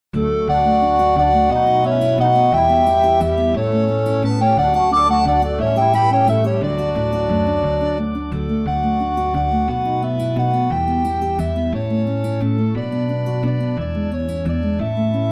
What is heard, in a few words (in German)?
brueche umformen begleitung; regelheft mathematik